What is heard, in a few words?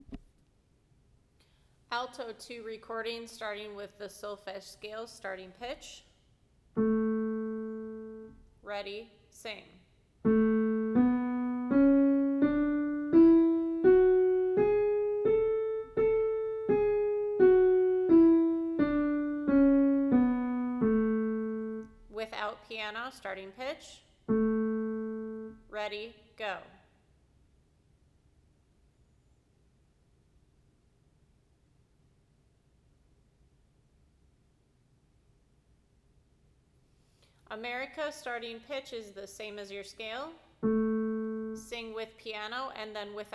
alto